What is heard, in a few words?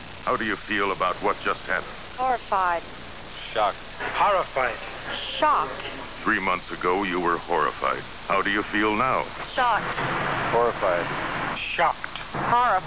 shocked